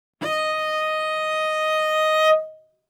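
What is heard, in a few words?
Cello arco fortissimo sul A Eb5; MIS Pitches - 2014; Strings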